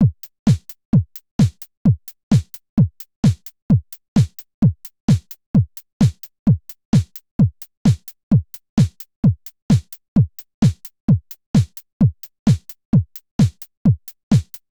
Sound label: Korg loop 130